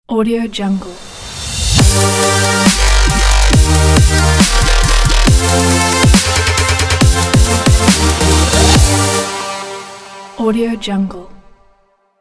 dubstep logo intro

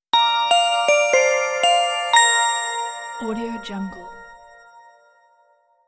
cartoon logo

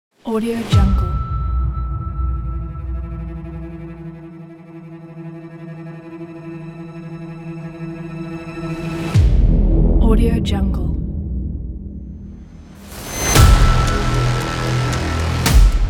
action trailer

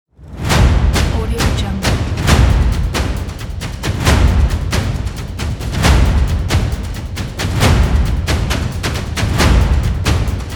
percussion opener

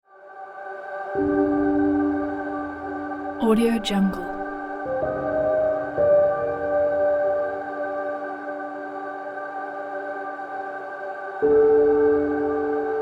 piano ambient

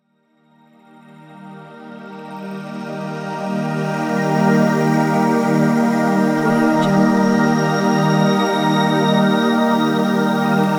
uplifting dream

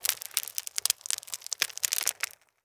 stones fine debris

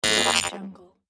futuristic robot alert

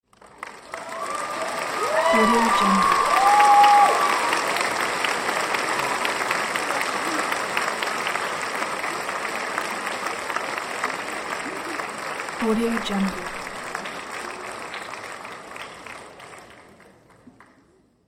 crowd applause at graduation auditorium

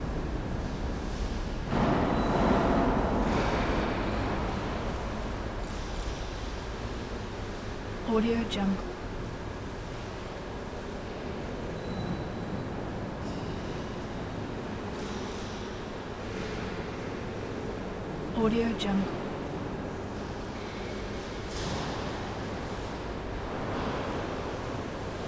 church ambience

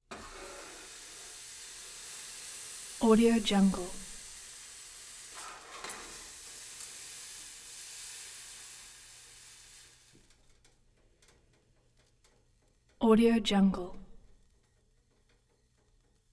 ice sizzle on stove; collanderson

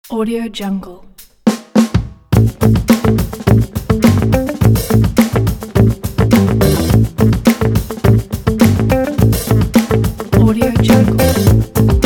the funky music

